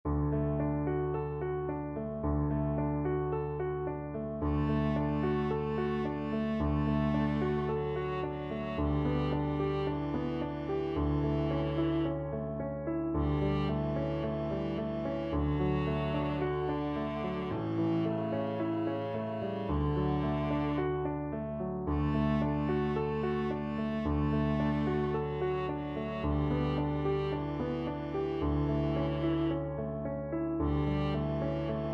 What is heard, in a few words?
viola